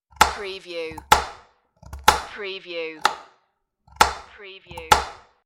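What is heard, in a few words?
Cutting Crisp Produce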